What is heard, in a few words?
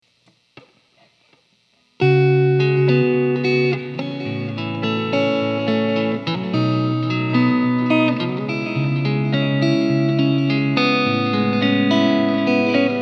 chorus, guitar; CE-2 is set to my favorite setting, with Rate at noon, and depth at 2pm